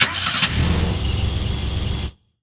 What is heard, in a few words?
Engine Start